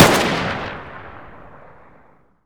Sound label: sg550 distance fire